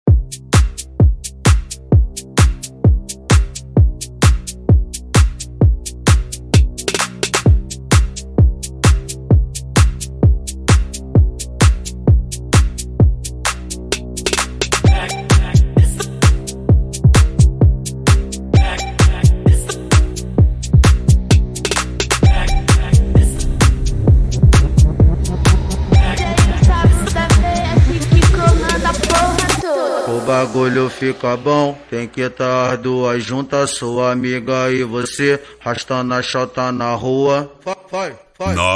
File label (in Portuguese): eletrofunk; som automotivo